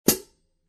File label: 14in Mastersound HiHats, cymbal